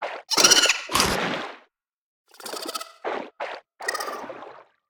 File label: creature trivalve feed scared